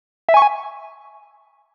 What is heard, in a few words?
game start; celebration Events